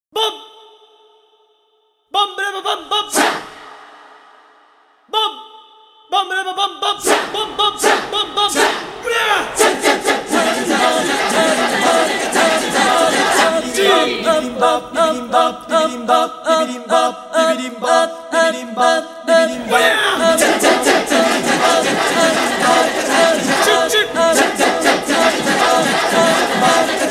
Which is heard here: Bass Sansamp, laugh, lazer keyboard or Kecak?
Kecak